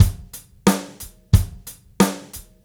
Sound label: Gretsch Groove 90, 1967 Gretsch Kit, 90 BPM, Drums